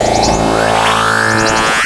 Powerup boost; Star Wars Clone Wars; XBOX; Xbox Live Effects

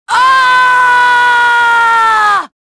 falling

